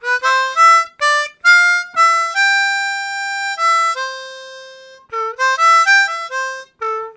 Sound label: Harmonica, WAV Riffs; Zero G